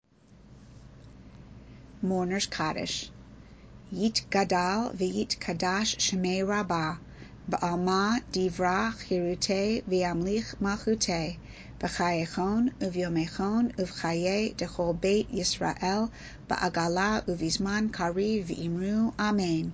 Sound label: mourners kaddish; kabbalat erev shabbat